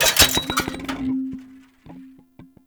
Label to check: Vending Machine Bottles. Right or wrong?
Right